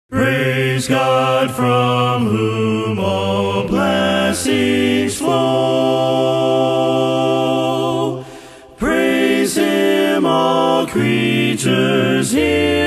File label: A Cappella